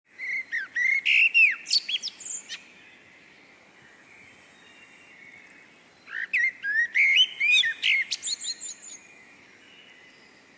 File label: Blackbird's Whistle